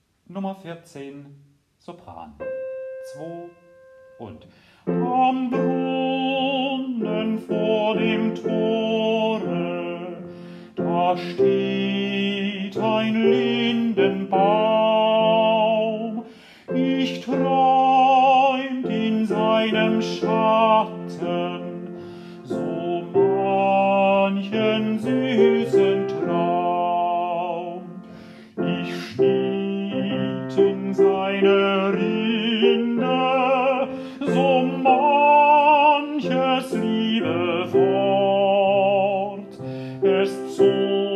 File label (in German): Sopran